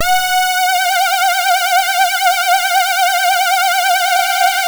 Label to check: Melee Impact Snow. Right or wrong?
Wrong